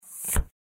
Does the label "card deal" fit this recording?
Yes